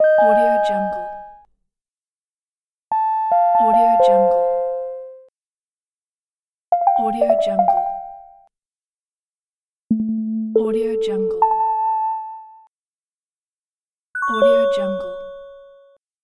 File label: warm app alerts